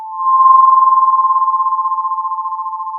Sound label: pop, Sound of a phone ringing